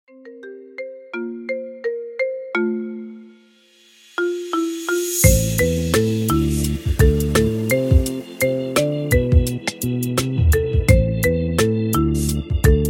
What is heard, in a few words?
marimba ringtone; suicidesquad